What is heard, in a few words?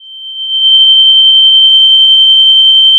Sound of a tea kettle whistling